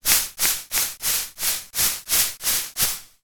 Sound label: sport pom pom shake rhythmic